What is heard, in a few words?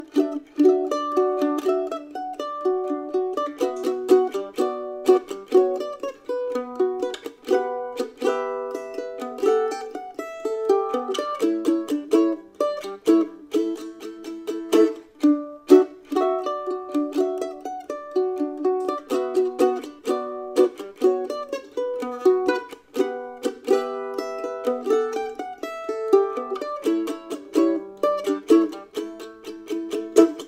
Mandolin